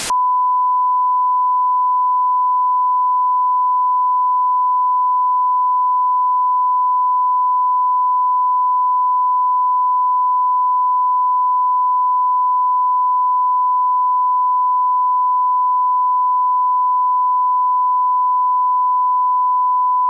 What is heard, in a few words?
Tone 1kHz stereo mono Burst